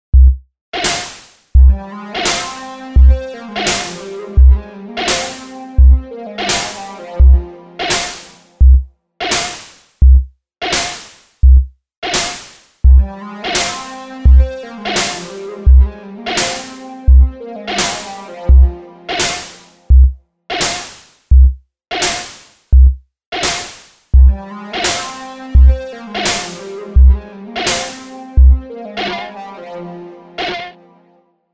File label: 1k Sound Wave